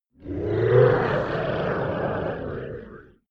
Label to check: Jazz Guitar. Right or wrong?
Wrong